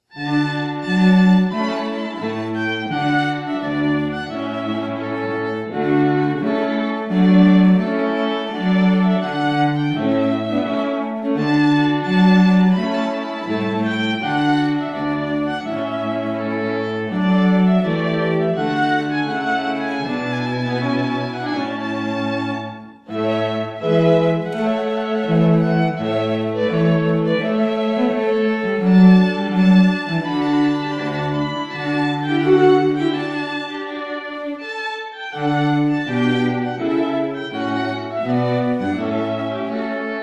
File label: Trumpet Tune; Purcell